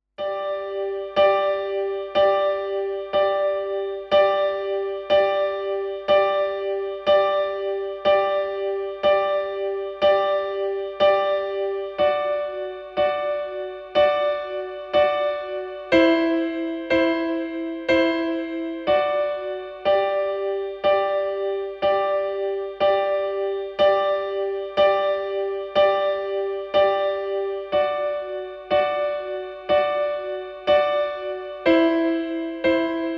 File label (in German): G Dur, Als Klavierver; Instrumental